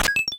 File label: Door Unlock